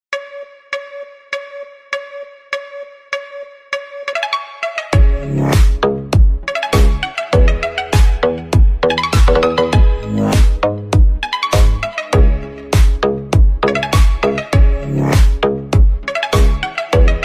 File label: iPhone 16 Pro Max